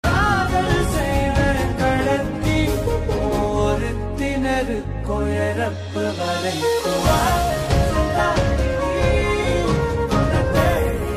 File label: love song ringtone, tamil ringtone; premalu; malayalam rington